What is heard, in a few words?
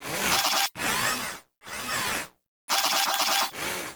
Foundry Working; Warframe; Hub; Liset Machines; Tenno Foundry